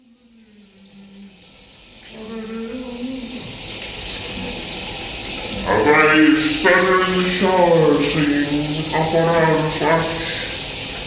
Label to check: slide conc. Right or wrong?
Wrong